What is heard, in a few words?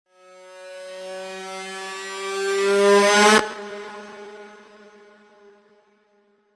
jumpscare; saturn